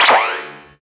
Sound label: weapon Change